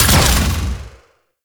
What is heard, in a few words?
Layer Shot, Shotgun; Warframe; Weapons; Tenno; Revenant; Phantasma Prime